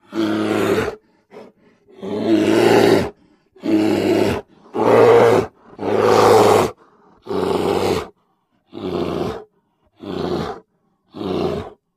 Bear, Grizzly Roars. Multiple Roars With Breaths. Close Perspective.